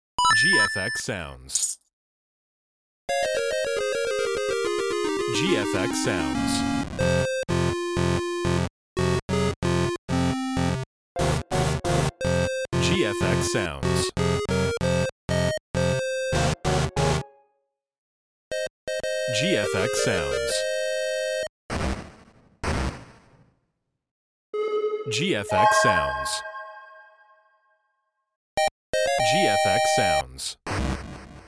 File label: arcade sfx pack; Twitch